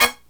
HIGH HIT, Brass Riffs

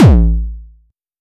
Attack Kick

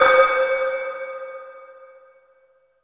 FILM BELL C4; Q Up Arts; Dream Experience

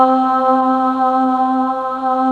soft vox, dance; WORS STATION; DANCE #3

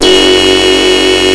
OB 19 F#2, Rare Synthesizer; Hollywood Edge - Giorgio Moroder Rare Synthesizer Collection; OB 4 VOX.

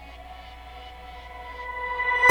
FARFISA ORGAN

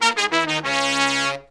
96BPM, Horns

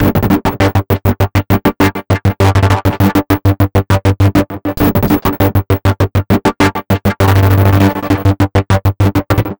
Envelope Beat; Drums & Drones

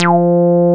101 E, SYN; INIS

